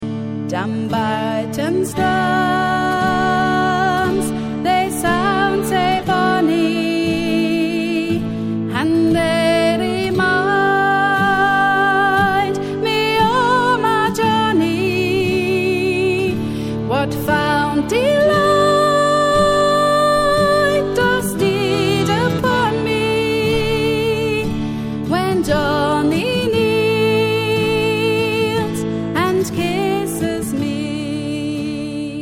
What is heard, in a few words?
Drums; Dumbarton's